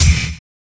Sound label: Kick, Drum & Bass; AMG - Prototype Drum & Bass